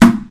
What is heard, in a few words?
plastic barrel impact bullet, physics, plastic